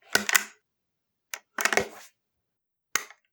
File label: 22 bolt open close dryfire, Gun Sounds